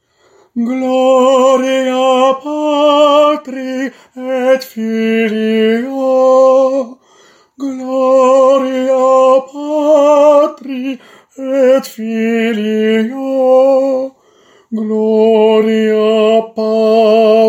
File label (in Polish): SOPRAN; Gloria Patri